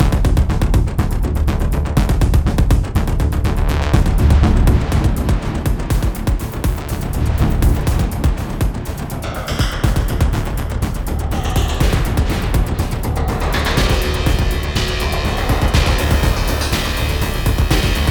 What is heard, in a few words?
pulse